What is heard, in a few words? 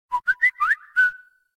whistle